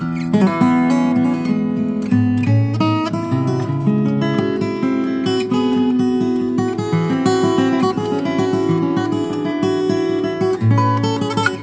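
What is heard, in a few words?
guitar test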